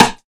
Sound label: Rim, Percs